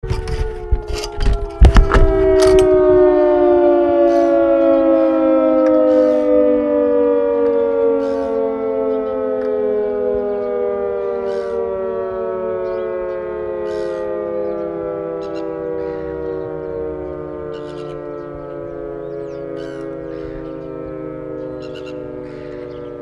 siren port chalmers

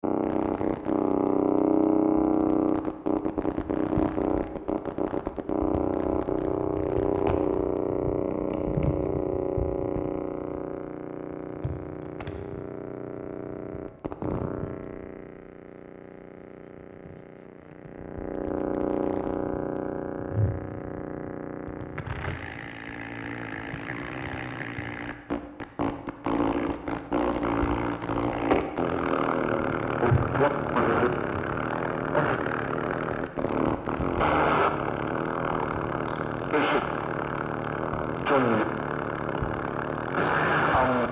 radio, electro gamelan

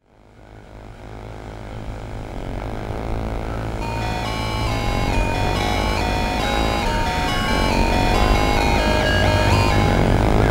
drone

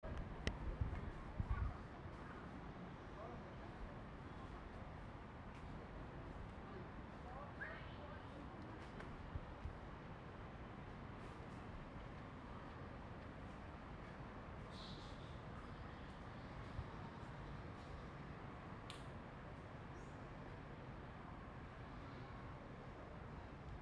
Ambient quiet, Cambodia phnom penh; 2019

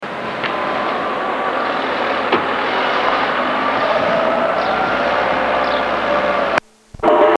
shrine dictorphone mexico, street recording

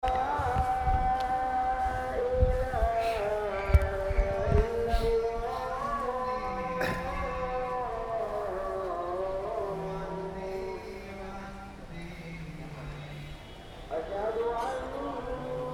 morning chants, North India

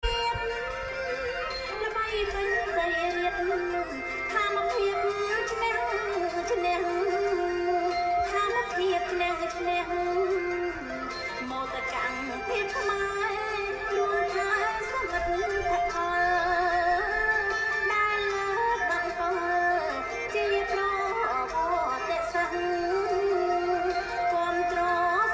music khmer TV; 2019; Cambodia phnom penh

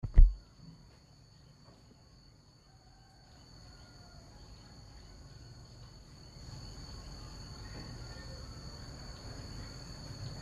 Ambience morning, Vietnam; 2019

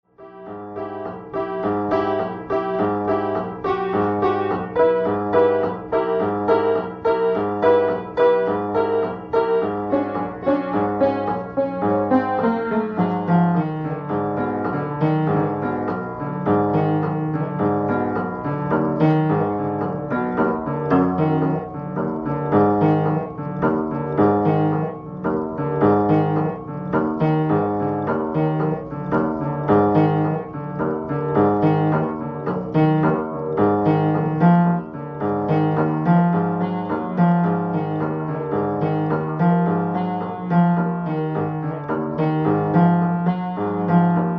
Raw piano

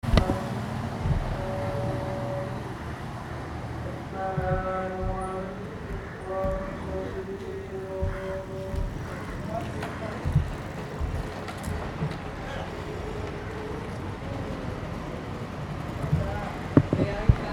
night mosques, South India street recordings

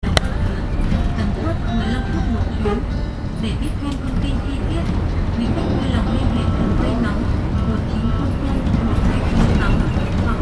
voices muisic weird, Vietnam; 2019

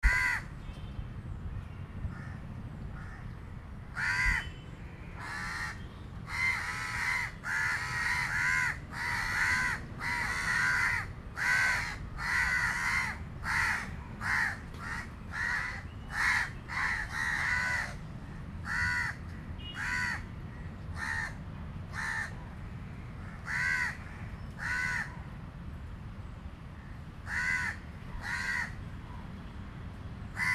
birds crows quiet, South India street recordings

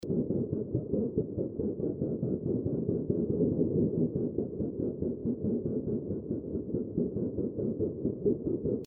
METAL VOICE MOD

